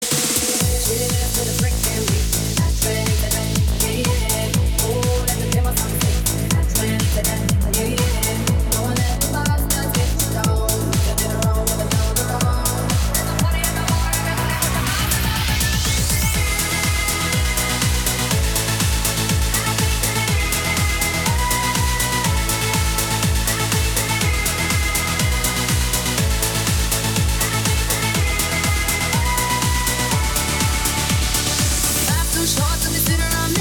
ringtone, radio mix

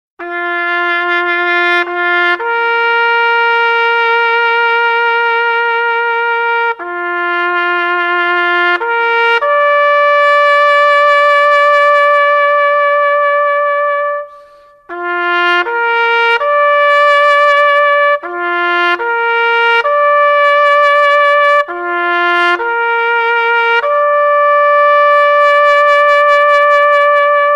Taps Bugle Call

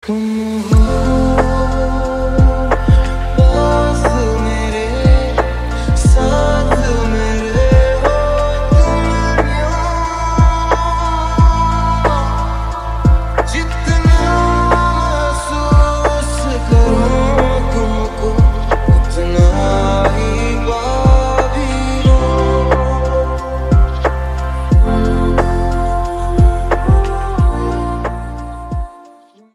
Lofi Mix

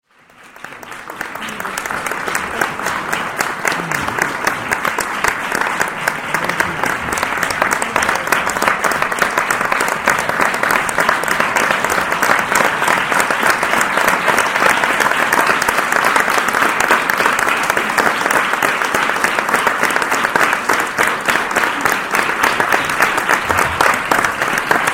applause sound

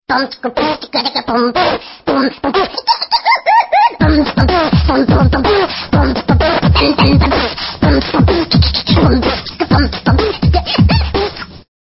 frog beatbox

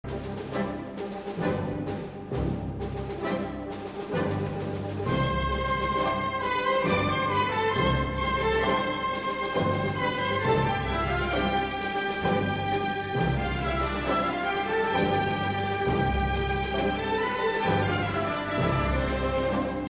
Bolero

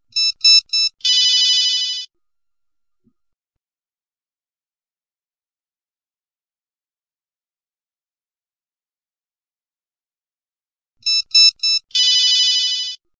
whatsapp msg tone

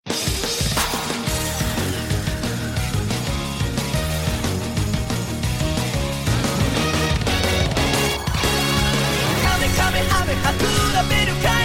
ringtone; dbkai 2014

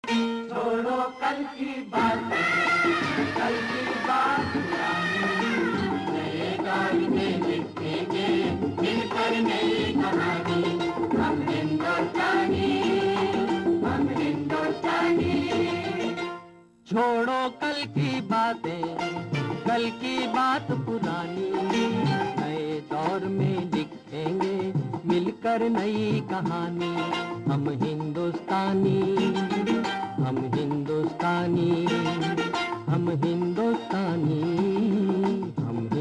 ringtone

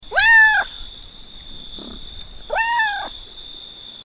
meow sound effect

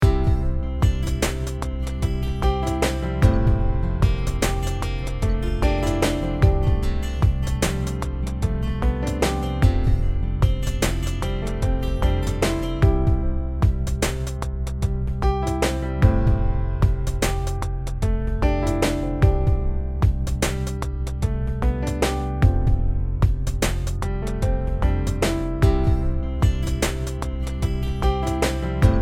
Blues, blues guitar piano

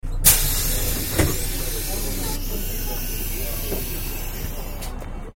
bus door opening sound effect

